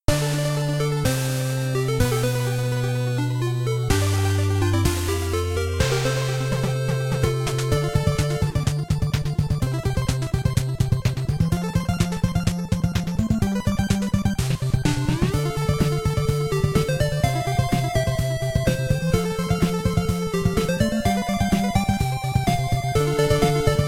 Win; Cluckles' Adventure

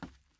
wood step; teardown; ps5 windows xbox series xs

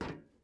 metal step

